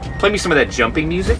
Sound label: jumping music